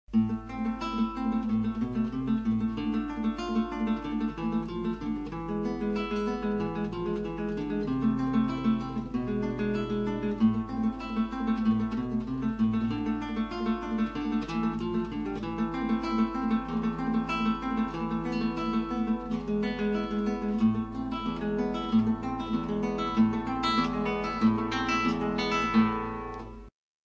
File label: Allegro